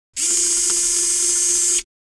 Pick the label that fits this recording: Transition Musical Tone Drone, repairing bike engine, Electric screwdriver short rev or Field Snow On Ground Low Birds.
Electric screwdriver short rev